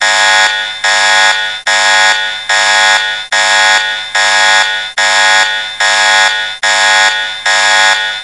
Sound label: sirena alarm